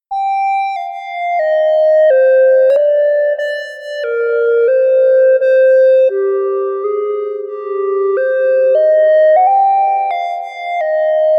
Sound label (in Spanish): melodia; musicologia